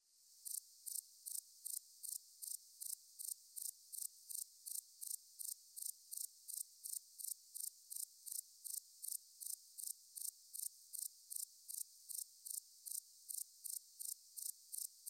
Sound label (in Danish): sandgræshoppe